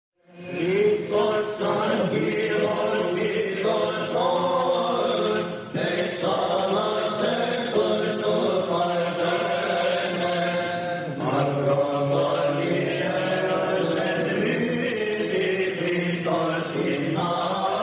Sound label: Choir